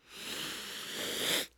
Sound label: Cocaine Snort